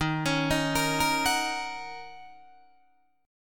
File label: D sharp Minor 7th, Guitar, DADGAD